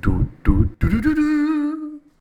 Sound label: ground